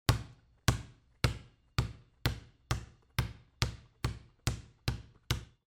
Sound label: ball bounce multiple